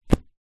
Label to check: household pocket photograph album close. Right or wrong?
Right